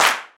clap single shot b key, Royality free clap sound clip tuned to the B note. Loudest frequency: 2776Hz; single hits one shots